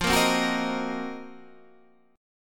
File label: C Minor 7th Flat 5th F, chord; Cm7b5/F strummed; JGuitar